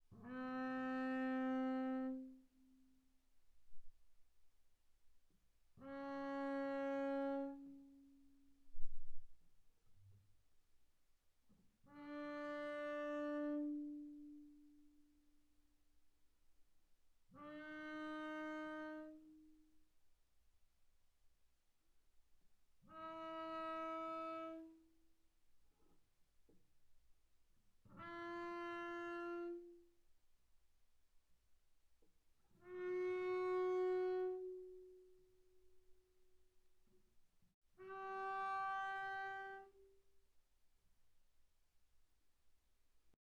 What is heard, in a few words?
Bass arco sul G mf C4 G4, double bass